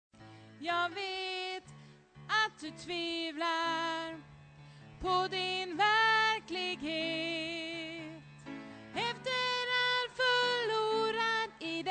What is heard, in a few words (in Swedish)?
bas, stammor